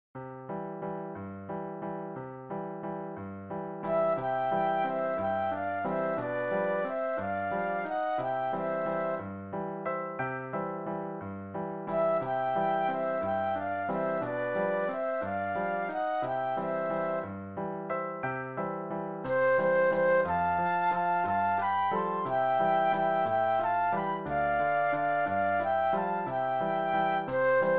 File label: flute duet and piano; classical